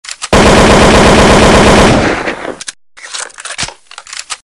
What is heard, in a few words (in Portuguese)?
sequencia de tiros